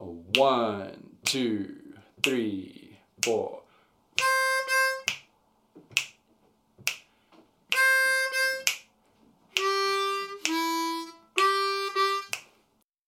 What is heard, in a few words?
Lick Slow, harmonica